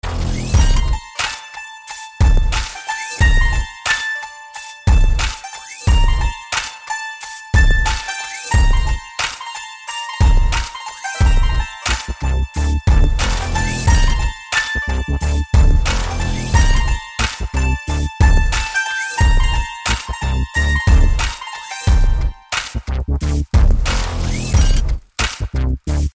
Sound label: Best Background Trac